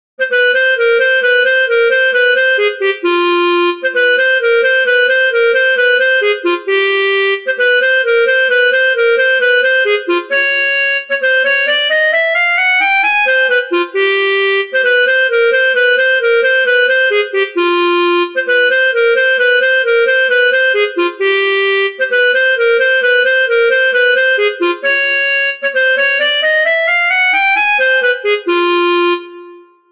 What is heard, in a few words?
Clarinet in Bb; Clarinet Part